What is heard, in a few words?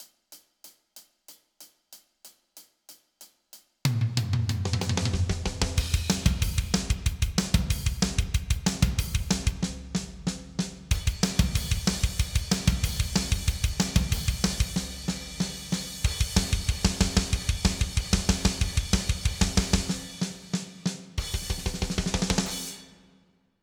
drum metal